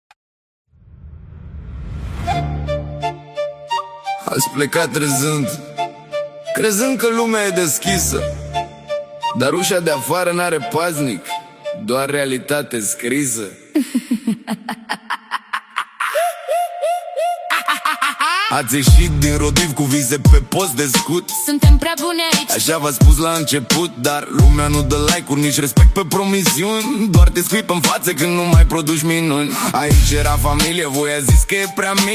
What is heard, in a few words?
Sarcastic Hip Hop